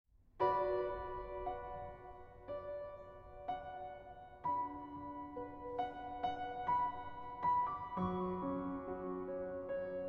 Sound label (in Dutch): Vleugelimprovisatie